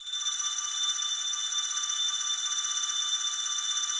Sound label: count Up Loop; archangels